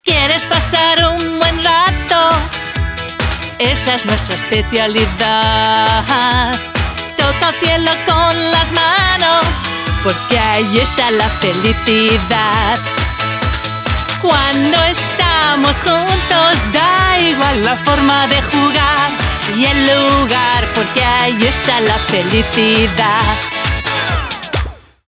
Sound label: Lead Mel Mix